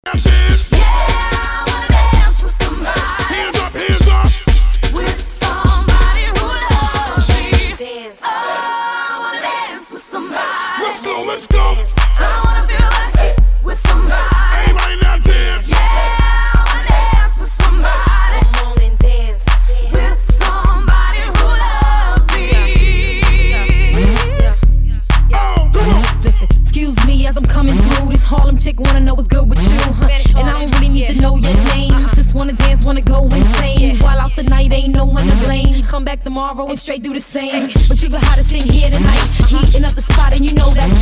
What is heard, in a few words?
Classic Party Hype Mash